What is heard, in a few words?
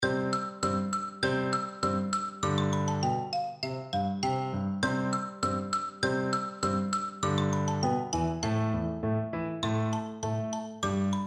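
Turkish March xylophone; percussion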